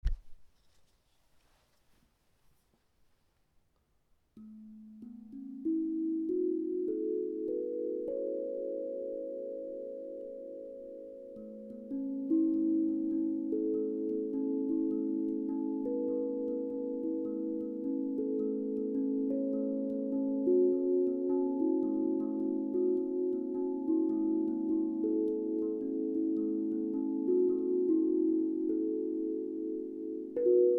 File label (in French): double pentatonique La et Si mineur